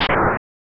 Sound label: Swoosh